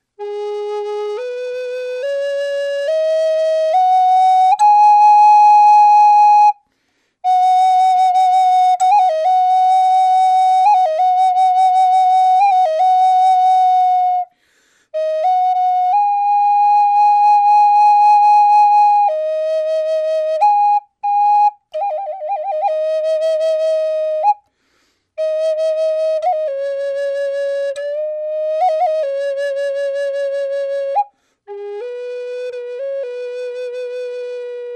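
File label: natural, flute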